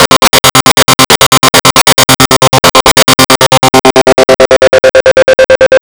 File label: dist loop; DISTLOOP KIT; AKAI